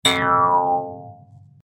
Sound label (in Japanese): 気持ちがこじれるような音